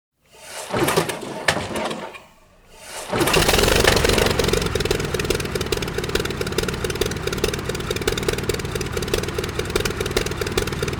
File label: propeller